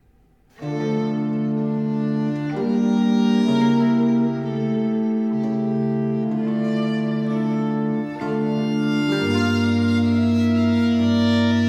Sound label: Sonate 7 in E minor - 3. Adagio; Arcangelo Corelli; Corelli - Complete Works; Klassika